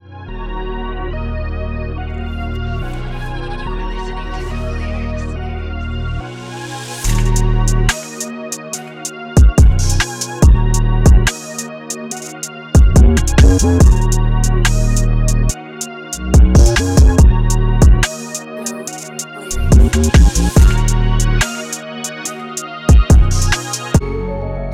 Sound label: Drill